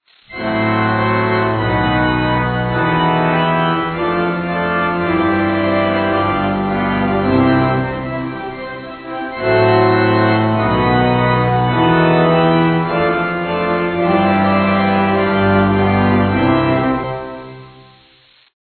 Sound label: wedding song